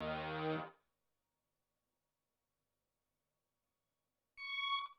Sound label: Synth Loop Ending